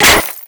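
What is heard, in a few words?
bullet impact paint metal; Modern Warfare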